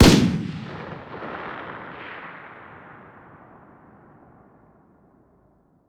fire dist, m16 weapons; Urban-Coalition; Decay; arccw ud

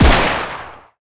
fire, uzi; Urban-Coalition; Decay; arccw ud

